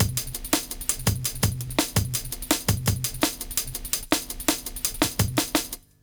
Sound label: LOOP, Explosive Jungle